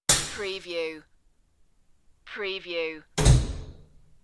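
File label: Mirror Door Open Close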